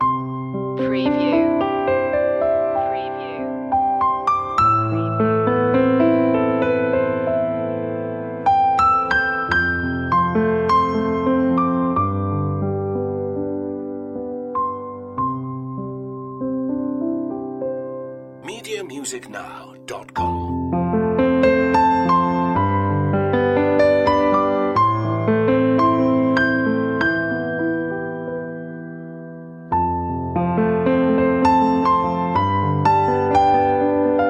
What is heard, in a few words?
piano loop; media music now